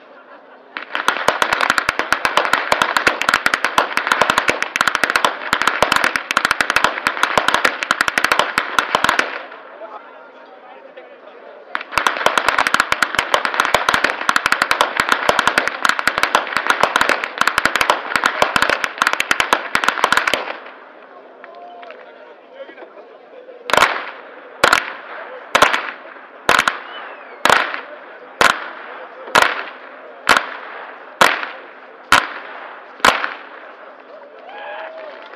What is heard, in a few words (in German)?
schnalzen, flachgau